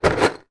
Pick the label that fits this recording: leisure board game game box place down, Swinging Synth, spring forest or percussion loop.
leisure board game game box place down